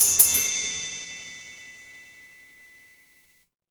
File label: instrument chime bar